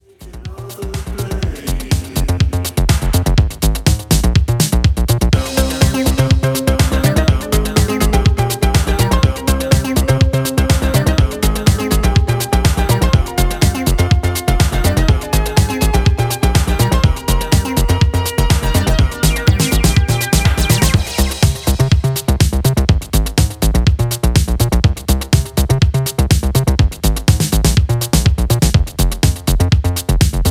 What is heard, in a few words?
down with the rhythm